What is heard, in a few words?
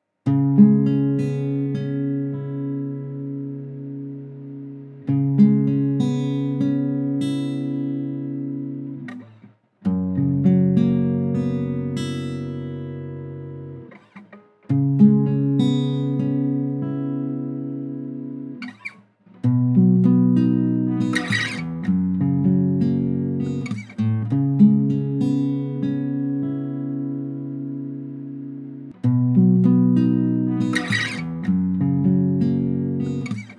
C#m100 acoustic